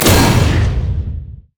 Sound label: impalingcharge; LoL; hecarim